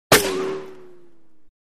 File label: Bullet Ricochet Off D